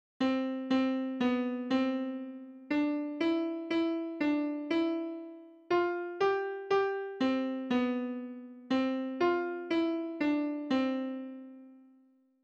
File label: minuet